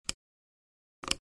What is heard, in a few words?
Mouse drag and drop click